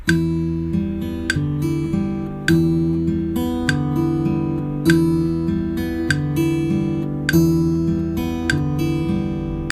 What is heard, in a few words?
arp